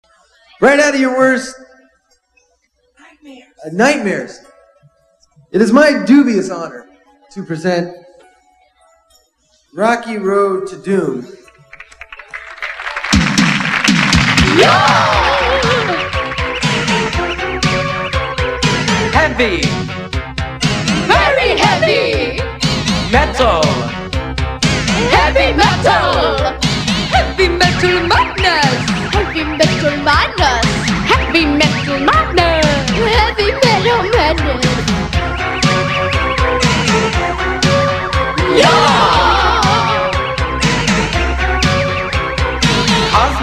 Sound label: heavy metal madness